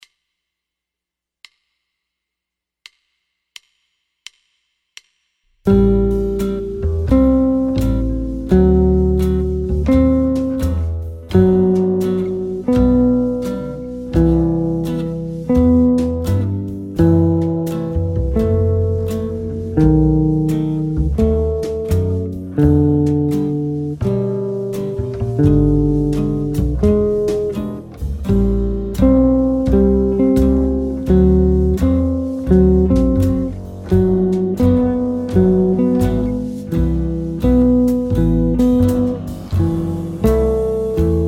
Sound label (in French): cadence automnale voicing 2 notes, guitare jazz